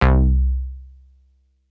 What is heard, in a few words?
funk Prophet Bass C2